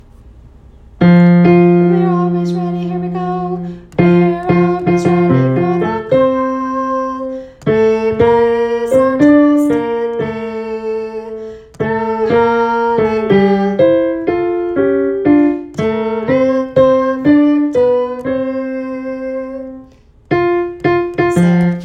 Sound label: Coast guard tenor, military songs